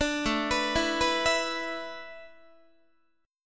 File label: A Suspended 2nd Suspended 4th, Guitar; Asus2sus4 arpeggiated